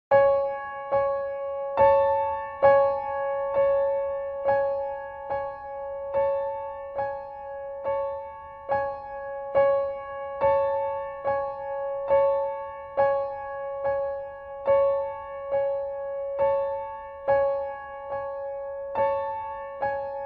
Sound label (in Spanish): musica de terror ritmos medidos; creepypastas